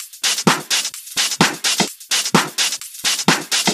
Minimal Loops

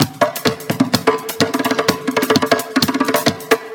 Latin House Loop 128 BPM; Vengeance Samples

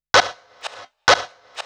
House Loops, Vocal Loops House 128BPM

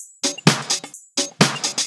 Percussive Loops 128BPM; Vengeance Samples

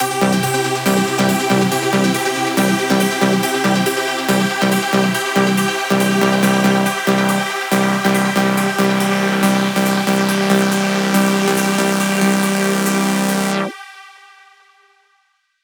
140BPM Major Happy C, Melody Fills; 8Bars; Vengeance Samples

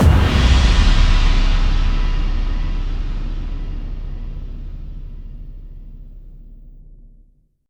Bassdrums FX, FX Kicks; Vengeance Samples

